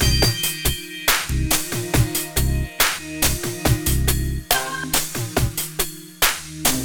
Dark Ride, Synchro Funk, Beats; Sonic Foundry (Sony Creative Software)

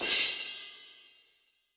Ride, Cymbal; Yamaha DTXpress-II

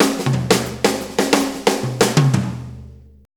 90 BREAK, DRUMS

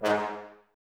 brass, Woodwind Brass Ensemble

